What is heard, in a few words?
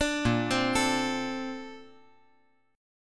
A sharp Major 9th; arpeggiated